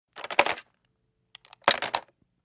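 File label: Telephone Pick Up Hang Up Light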